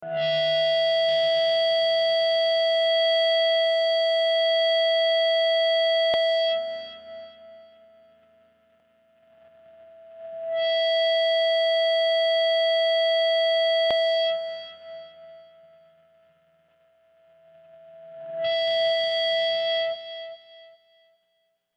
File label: feedback